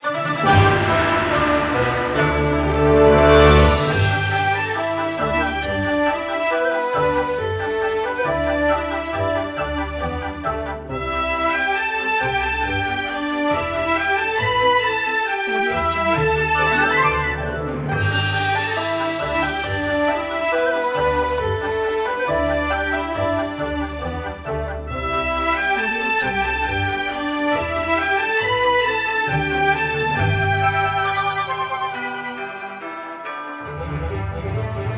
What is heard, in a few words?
kokyu chinese orchestra